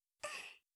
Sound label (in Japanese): hanikami, breath